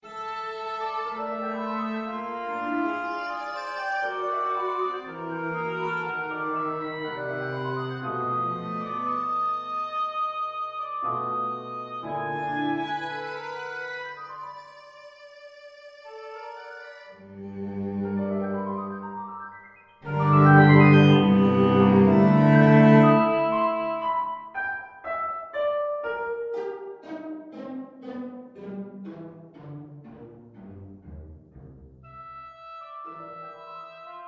Things That Creak and Go Bump in the Night, oboe cello piano